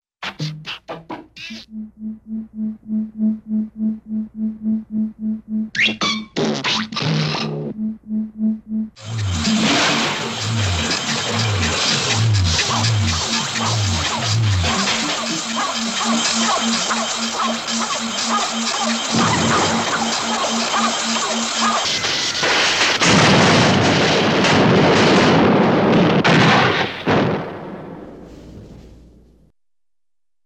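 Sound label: Computer Breaking Down with pulsing Hum Pops Sputters, cartoon; Hollywood Edge; Cartoon Trax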